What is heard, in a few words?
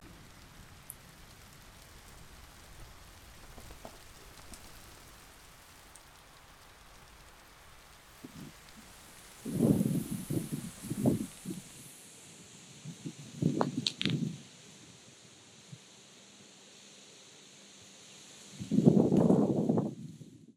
when it rains